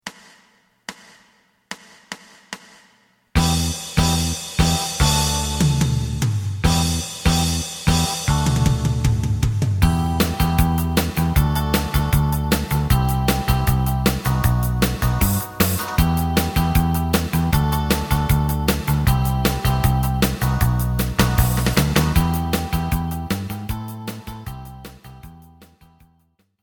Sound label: lead